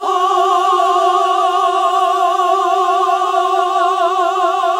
GOSP. AAAHH

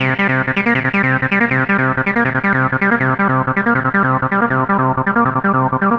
Acid Loops, 160; Dance Synths; Hardcore Loops

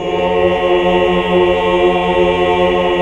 AAH 2 6 L; Best Service Gigapack 1

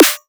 bell pen snare

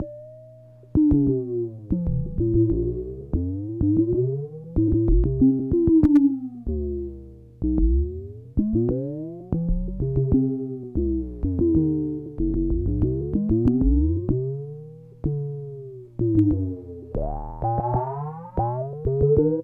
WEIRD, SYNTH SEQ, Trance; AMG; Global Trance Mission VOL-2

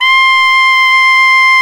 SAX TENOR F1; Tenor V-sw; Tenor 3way; Roland LCDP07 Super Sax

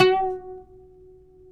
PLK OUD, Roland LA Composer

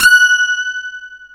DS8 F#5, Korg; Back In Time Records; Korg Universe VOL-1

